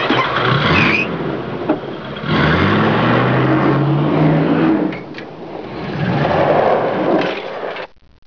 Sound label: Car Start